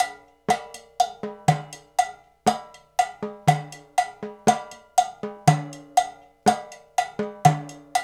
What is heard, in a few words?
120 CHA, African Contemporary, Cha Cha Cha; Spectrasonics; Supreme Beats